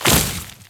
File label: Step Veg, Footsteps; Warframe; Grineer